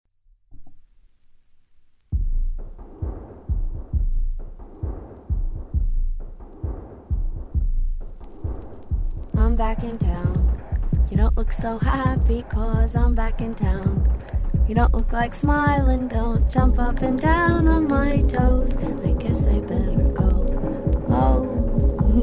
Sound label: House Music